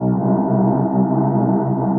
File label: Scan Cloud Slow; Ableton; Granulator III; Device Templates